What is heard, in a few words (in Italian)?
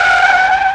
Frenata, motori